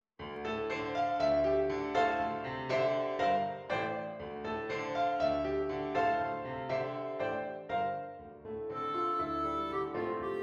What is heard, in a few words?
Clarinet; bass clarinet